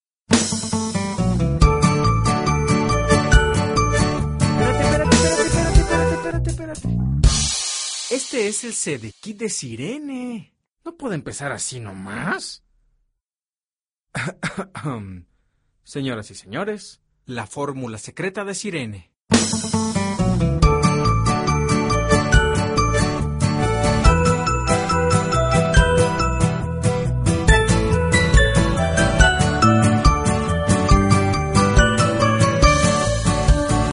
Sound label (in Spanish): sirene